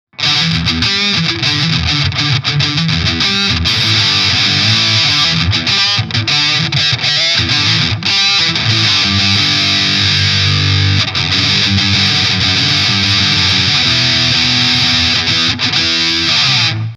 Nano Metal Muff Distortion Mids 11 Gain 12h; Electro-Harmonix

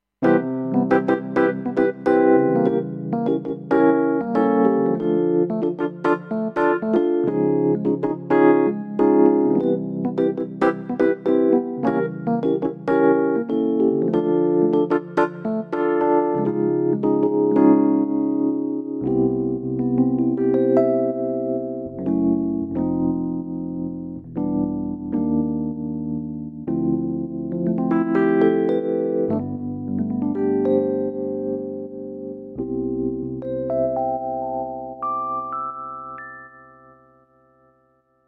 Tremolo EP

